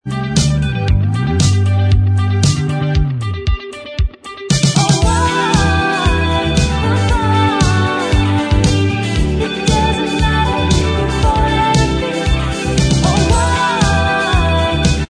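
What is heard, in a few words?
backing vocals track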